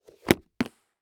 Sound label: Household Container Close Lid Plastic